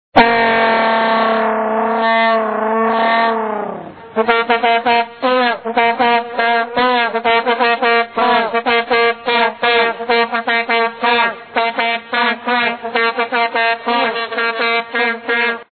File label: Vuvuzela